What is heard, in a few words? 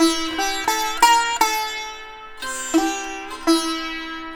SITAR, Indian Traditions